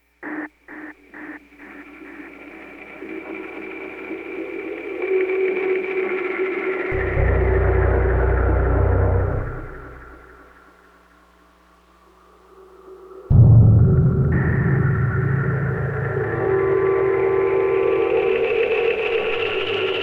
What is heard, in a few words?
Ambient Cue